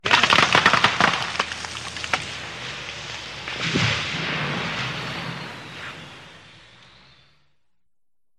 Arbre qui tombe

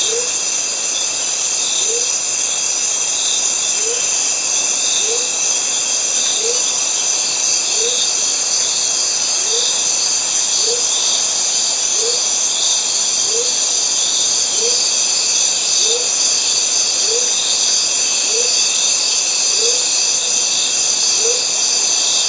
Foret Amerique centrale

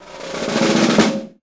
Roulement tambour; Cirque

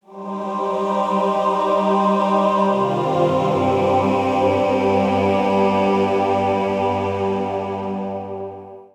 Spirituel Link; Jingles medley

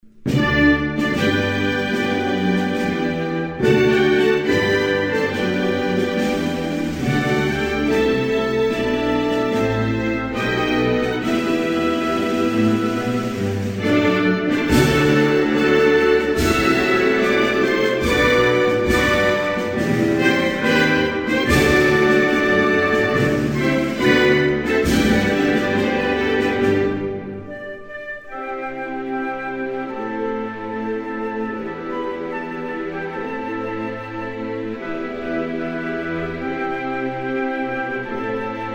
Ouzbekistan, Hymnes nationaux

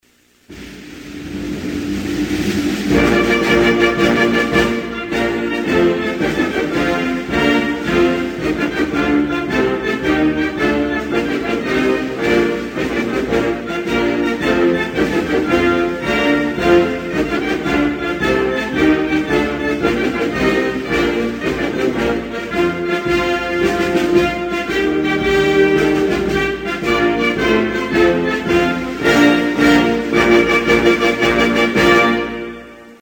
Emirats Arabes Unis, Hymnes nationaux

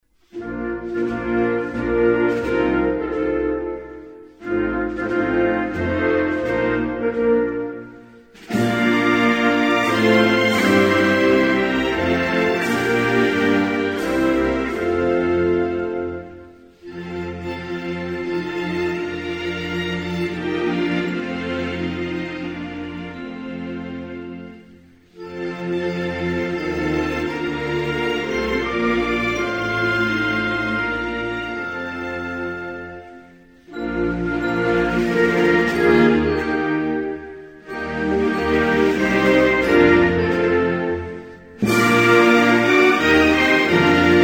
Suisse, Hymnes nationaux

